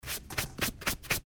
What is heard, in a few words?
sweeping